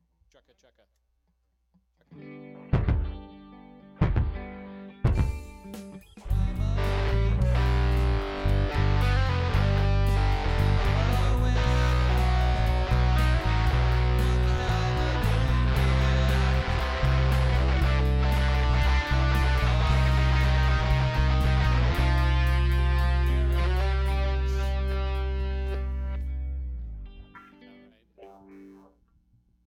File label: Strings Mix